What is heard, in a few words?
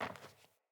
Sandals Walk Metal; Player; Footsteps